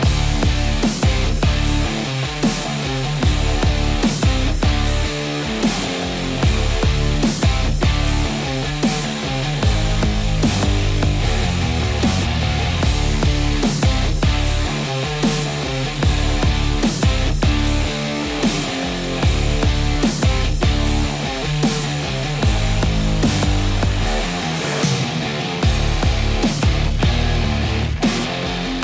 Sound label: Forest Fighting Loop; bright memory mobile